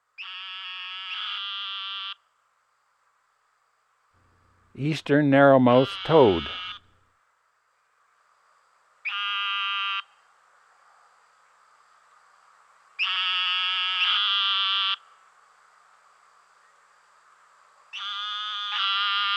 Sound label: Eastern Narrowmouth Toad; Western North America; Dan Gibson; Wildlife Identification By Sound